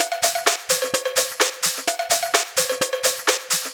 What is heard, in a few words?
House loops 128BPM; Vengeance Samples